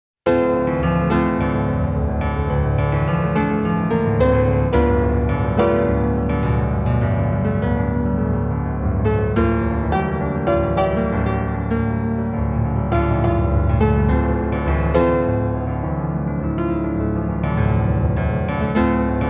piano version; lemon angel project; sound addict

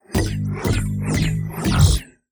Intro Count

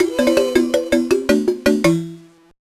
Jingle Negativ; My Animal Centre in Africa; GBA

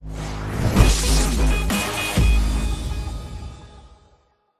Mission Completed

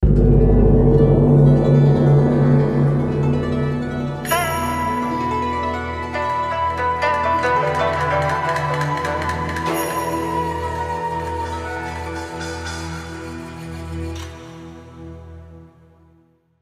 BGM; Adventure Player; psp

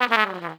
Trumpet Missednote

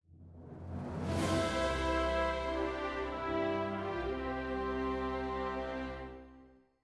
Stinger; New Parties

